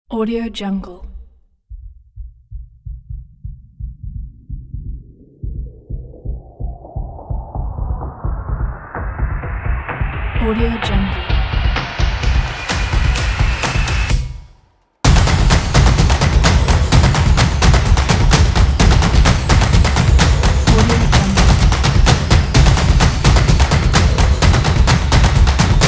percussion

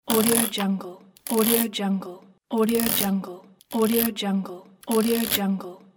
clockwork

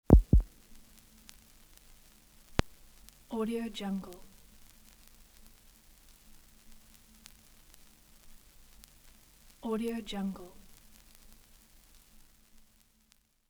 vintage vinyl record crackling; jablonczay

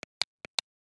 Nintendo Wii button; prosoundfx

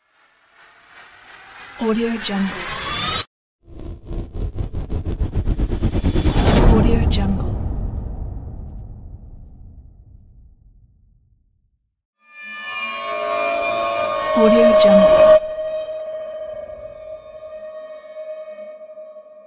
reverse rise tension horror; sfx pack; archisound

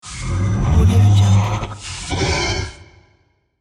monster

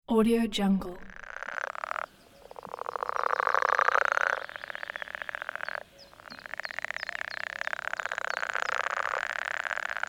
swamp frogs

